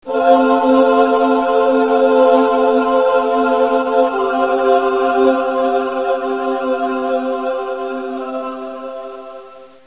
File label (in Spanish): coro